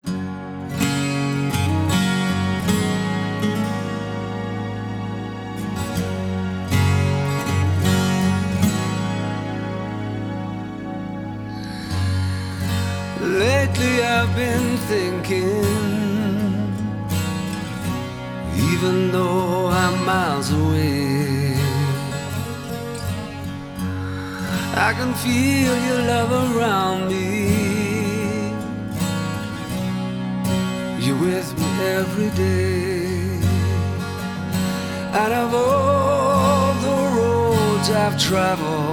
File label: acoustic version